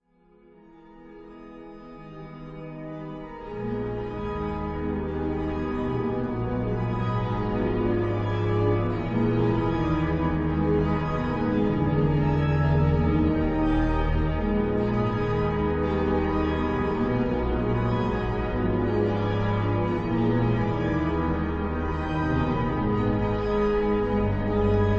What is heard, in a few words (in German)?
glocken